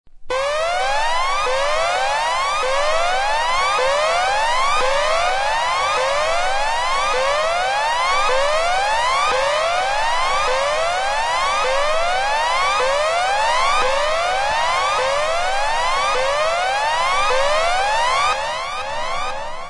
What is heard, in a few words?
Alarm; Funk